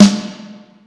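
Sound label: Snare, Yamaha SY22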